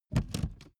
car seat slide backward short

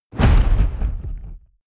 fire whoosh hard; swooshes-swishes

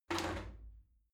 shower slide door open hit soft

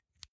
insert xlr extension; objekte; elektronik

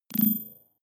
data transfer tonal, signale; soundpool

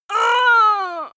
female hit; soundpool

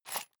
sundries shake soft; berufe; handwerker; soundpool

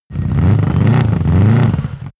car subaru impreza rev up

